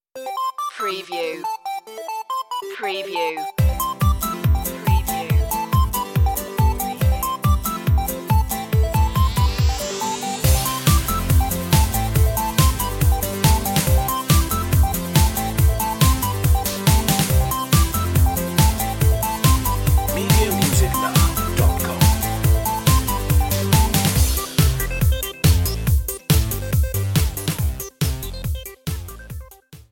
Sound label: singing robots